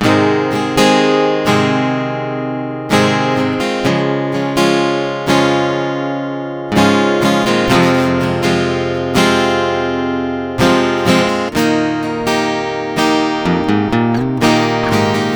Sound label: Guitar - 125 BPM (G# Major), Acoustic Guitar; Late Night Thoughts; GhostHack; Free Guitar And Piano Samples